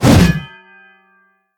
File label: swing metal